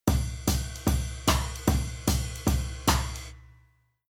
Rhythm Swing Jazz

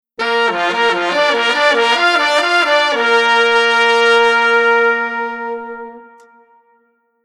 Horn Unis Tp Tb A Sax

